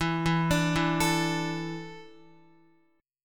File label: E 7th Suspended 4th, Guitar; arpeggiated